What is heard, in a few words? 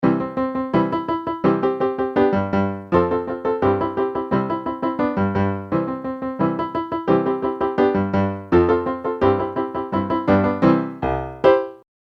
Sixteenth Notes Rests